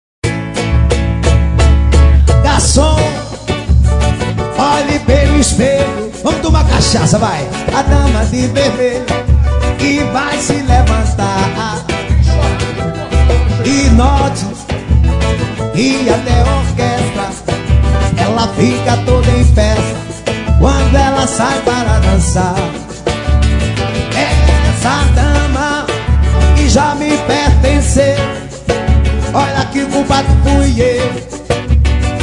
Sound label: Pagode Brega; Pagode Samba